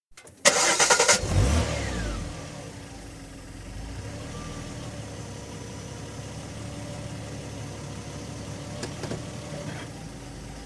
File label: Jaguar Sovereign, exterior, switch on, depart; 3.6 litre, 1986/7 model; Car Sound Effects; BBC